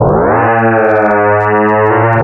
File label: blast, Electronic Loops